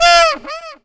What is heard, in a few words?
SAX RIF; E-Lab; X Static Goldmine 2; akai; SAX RIFF2